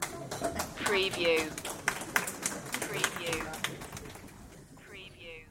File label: Audience Applause; beanstalk audio